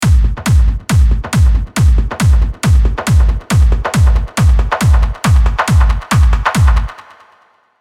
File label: kick Loop